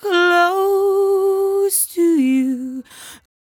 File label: 57bpm Am, Velvet Vocal; Zero-G